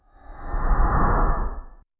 som sombrio negro de magi

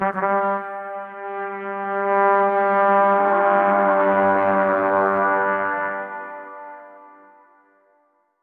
som de trompete aliengena

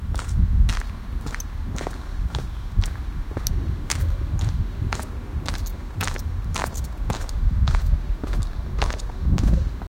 andando na terra, environment

